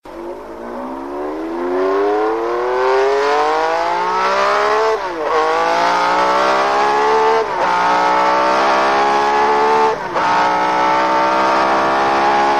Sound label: Ferrari V12